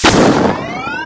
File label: awp; zombie plague